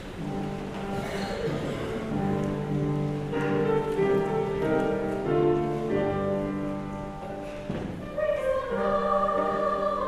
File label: Gospel Acclamation